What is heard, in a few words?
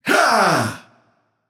grunt hit; camazotz